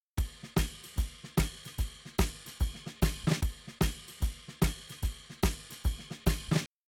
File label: Drums, shuffle drums; WDR2